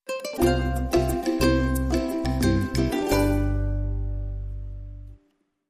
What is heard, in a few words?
Hawaiian, Sting